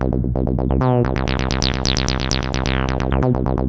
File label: C2, synth line; 90 s Sample CDs; NiceBeats; SynthLine VOL-3